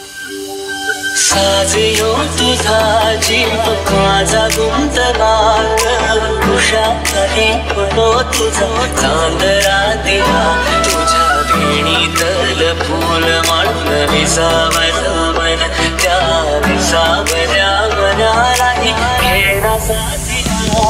Marathi Ringtone

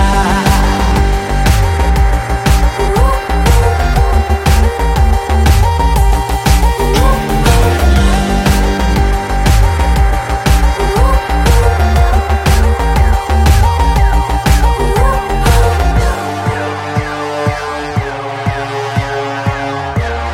Music Ringtone

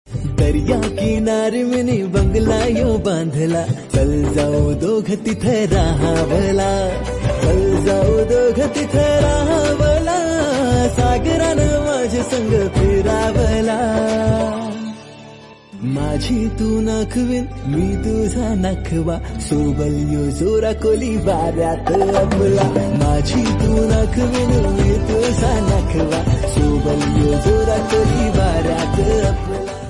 Marathi Ringtone

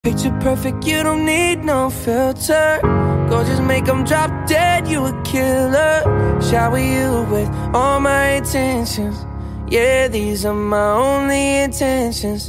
English Ringtone

